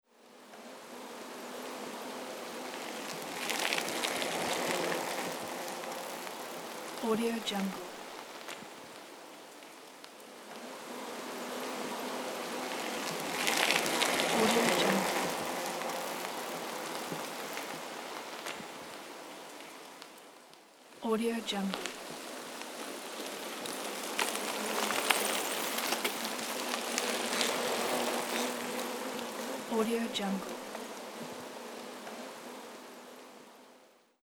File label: bicycle passing by